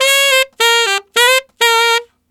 Tenor in G, Tenor Sax Loops; Sonic Foundry (Sony Creative Software); Jazz Solos and Sections

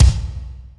KORG KICK; Greytsounds VOL-1

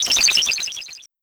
Noiz; Sonic Foundry (Sony Creative Software); Esoterik Beatz; Warsaw FX

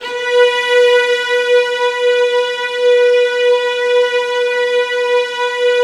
VIOLINS C#, Strings; ILIO; Synclavier Strings

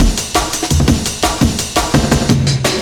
Amen, breaks; M8

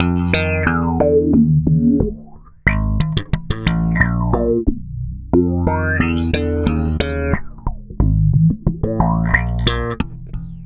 Processed Slap Bass, Electric Bass

